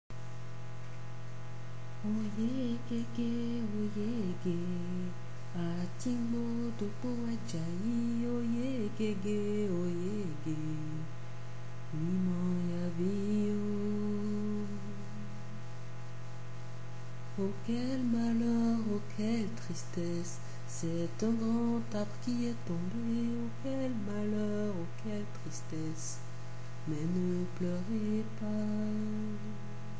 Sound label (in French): Un chant pour accompagner Wangari; Wangari Maathai, la Kényane qui plantait des arbres est partie rejoindre le souffle premie; Celle qui plantait des arbres